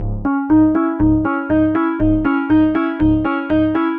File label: Synth Pattern; Electrocution